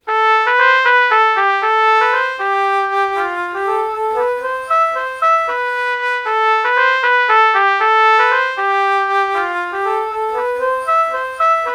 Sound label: alto flute trumpet melody morph